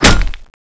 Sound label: lance wall stone, weapons